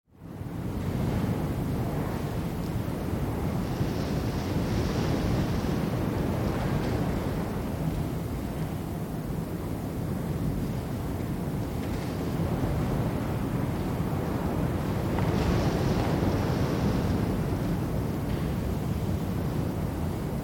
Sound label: Wind Atmosphere Inside huge Flint Barn; Empty atmos on a stormy day